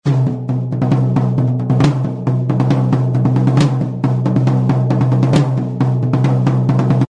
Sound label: tom tom drum rhythm